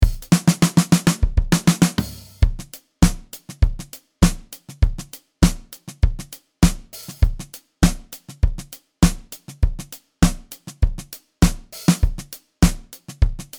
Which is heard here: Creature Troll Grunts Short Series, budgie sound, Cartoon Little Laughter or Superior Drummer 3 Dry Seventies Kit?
Superior Drummer 3 Dry Seventies Kit